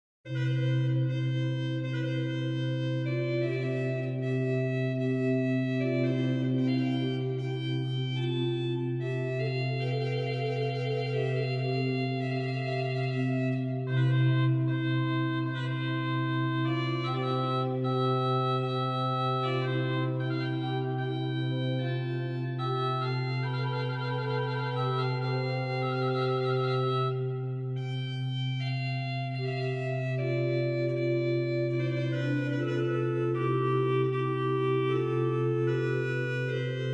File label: Musette Magnificat Noel, Organ Music, Souvigny; Lasceux